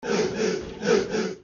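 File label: exclamation; vozglas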